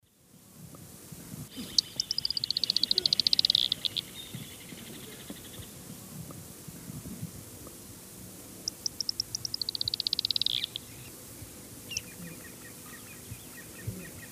Tyrannus savana, calls and song; S. America; Eastern Lowlands

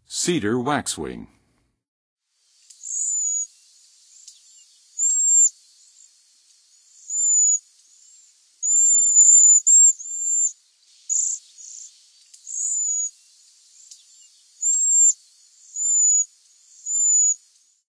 Cedar Waxwing, Songs of Birds; North America; Western USA; Western USA Songbirds

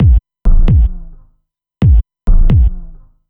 Kick Loop, Dance Beats

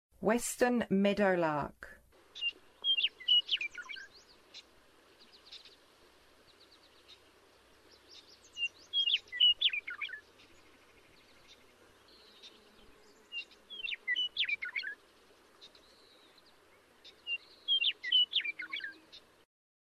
Western Meadowlark; North America

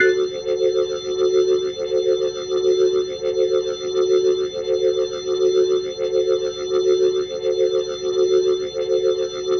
Glowing Organ F# Dim, Organ Licks; Hip-Hop

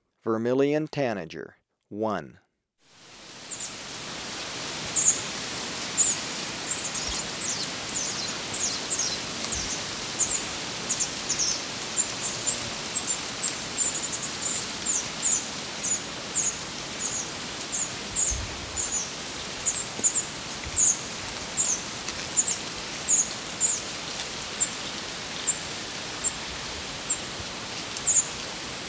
Vermilion Tanager; S. America

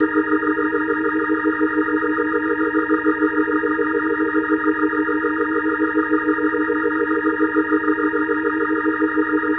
Organ D# Sus, Hip-Hop Organ Licks

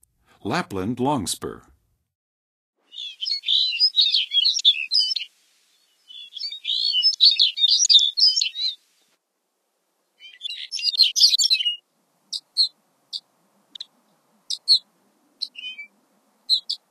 Lapland Longspur, Songs of Birds of North America; Western USA; Western USA Songbirds

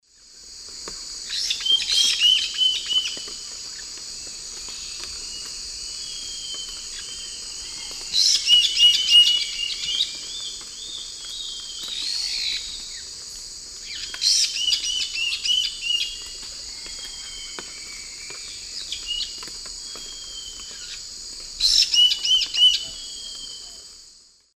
Pionites melanocephalus, calls; S. America; Eastern Lowlands

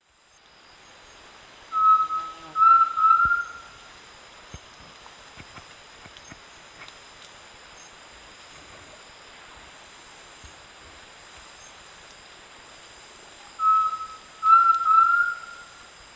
Crypturellus undulatus, song; S. America; Eastern Lowlands

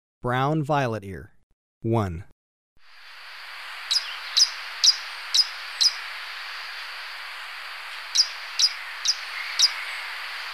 Brown Violetear; S. America

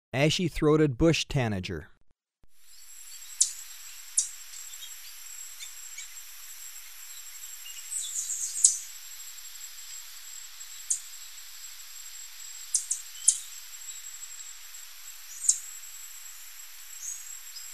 Ashy-throated Bush-Tanager; S. America

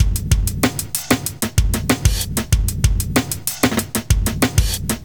kit; Sound Effect Library

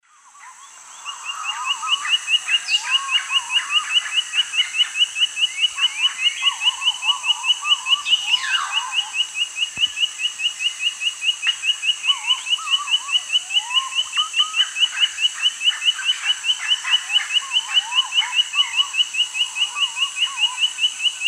Legatus leucophaius, fast alternate song; S. America; Eastern Lowlands

